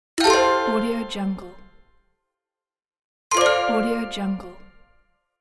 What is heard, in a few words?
game award